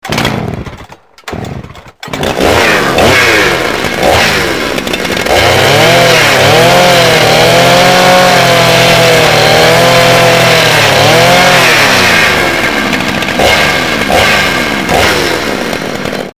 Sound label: chainsaw